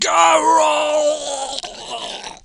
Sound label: Die